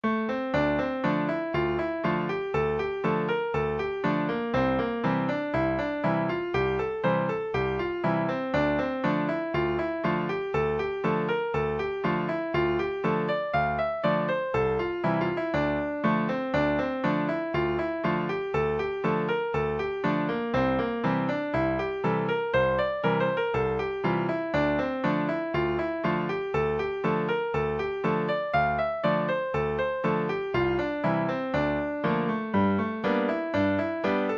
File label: fiddle blues, session tune midis